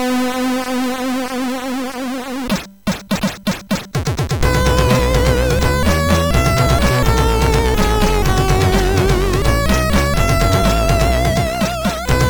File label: Intro Tune, MOS6581R4